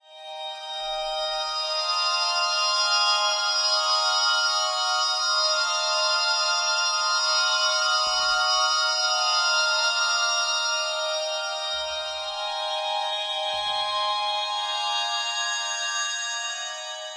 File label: pad borealis basin